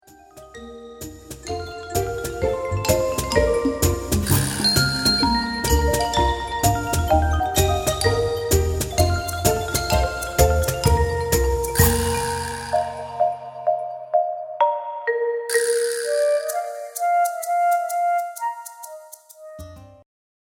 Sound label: Instrumental